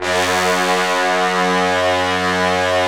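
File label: brass trombones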